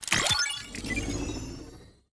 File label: ui pickup ticket